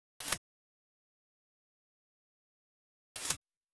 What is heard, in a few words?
In Flight Birds